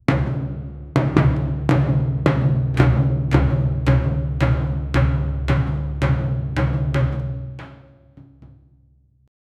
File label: ancient sundanese music, traditional